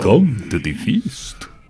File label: vampyr; enemies; draugnorak